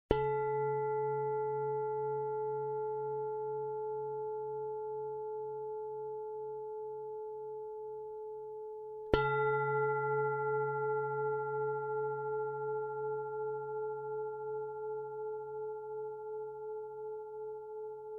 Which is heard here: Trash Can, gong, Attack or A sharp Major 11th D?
gong